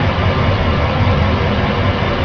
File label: engine, ambient objects